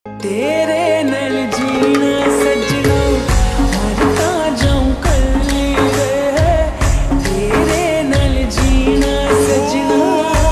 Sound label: Ringtone Slowed Reverb